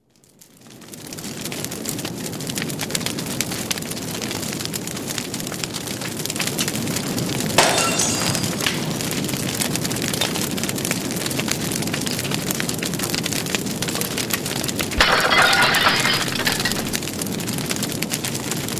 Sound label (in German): feuer